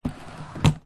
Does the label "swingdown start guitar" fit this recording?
No